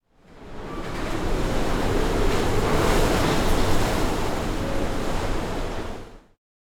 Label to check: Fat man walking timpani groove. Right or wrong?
Wrong